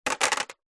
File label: household necklace small stones pebbles set down plastic surface